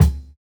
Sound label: Kick, jungle breaks drum sounds